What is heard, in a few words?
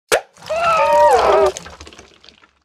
Tar Slime Play